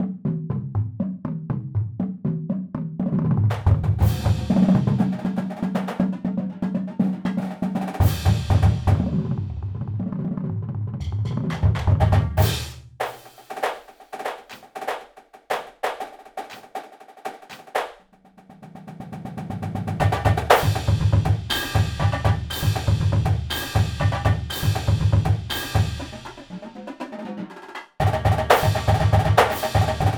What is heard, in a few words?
Marching Percussion; finale